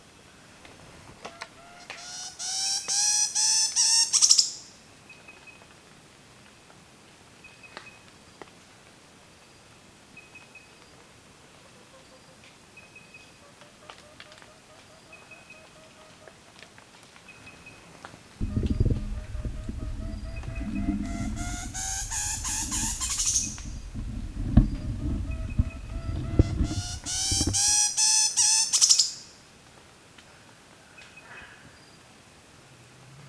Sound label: Vocalisation crescendo twitter by Angolan dwarf galago G. kumbirensis